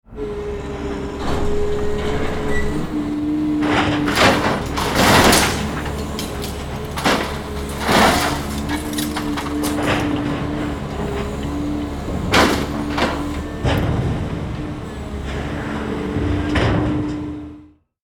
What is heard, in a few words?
Garbage truck loading trash garbage collection; construction vehicles